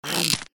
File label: Eat fish; Troll Face Quest Unlucky; Android